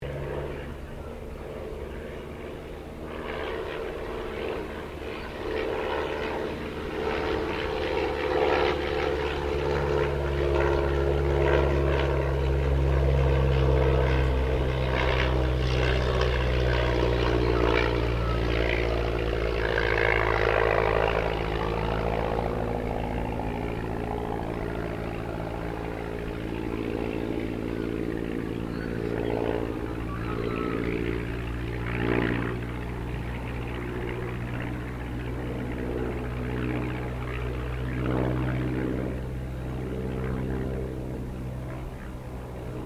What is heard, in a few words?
AIRCRAFT RYAN STM-S2 PASS; 1939; Airplanes; Vehicles